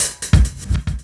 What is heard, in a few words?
kick hat